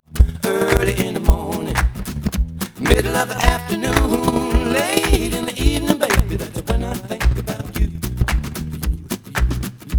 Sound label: noised; 4bit